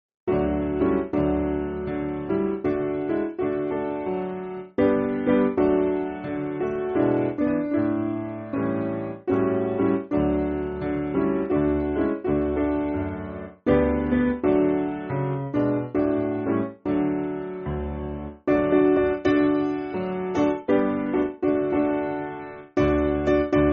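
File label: Piano, Backing Files for Churches; In The Harvest; Stewardship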